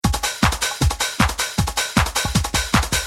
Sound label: house